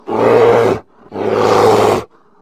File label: bear melee